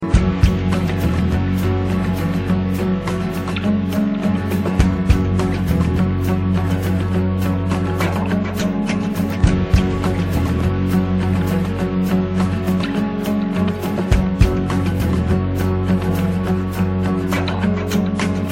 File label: Guitar Tune